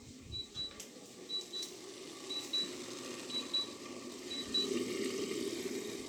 BIP BIP cuisinière; Bruitages

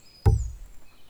1x Bonne Poutre, Percu Bois

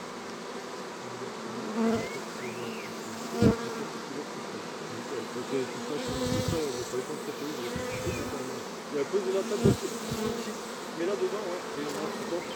Vol d abeille petits passages; Vols Bourdn abeilles

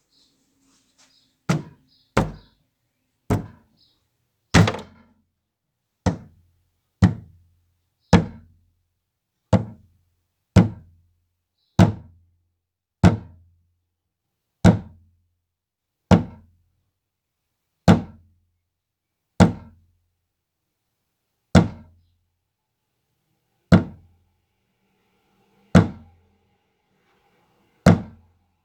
Bruitages bois choklairs tom aigu; Percu Bois

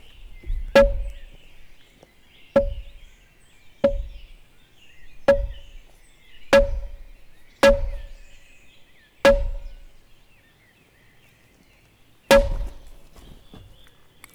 Gourdin sur buche qui sonne bien x8; Percu Bois

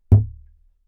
Tom basse; Percu Bois

